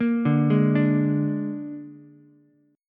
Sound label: Ab Suspended 2nd Flat 5th Ab down Ukulele; arpeggiated; JGuitar